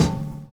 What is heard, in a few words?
OLD SNARE; Univers Sons